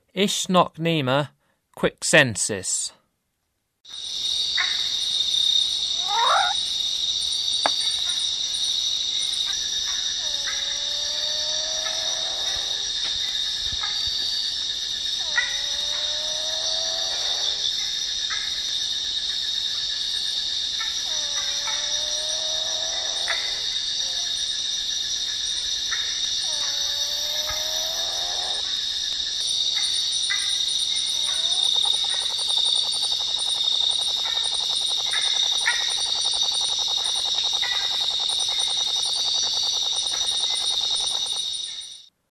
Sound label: Ischnocnema quixensis, Frogs of Ecuadorian Amazon; S. America